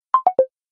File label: Low Battery; China Mobile; A4s